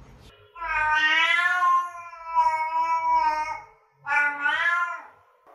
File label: meow